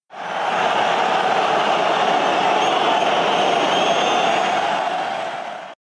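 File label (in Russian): реакция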